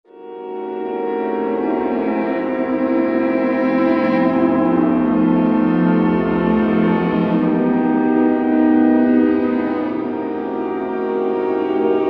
Mad Orchestra, Roland V-Synth; Factory Patches

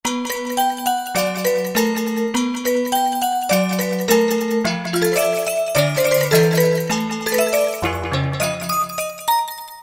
Perc Child, Roland JD-990; Factory Patches

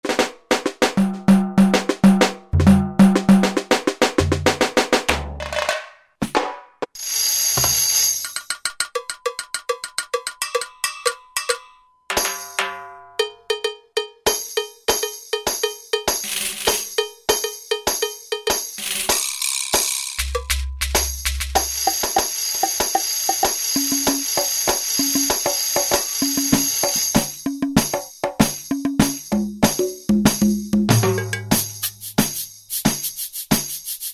Latin Set, Roland SR-JV80-18; World; Roland SR-JV80-18 World Collection Latin

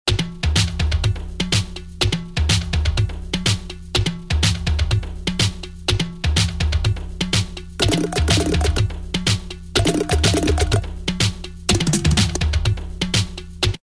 Jungle 124; Roland M-DC1

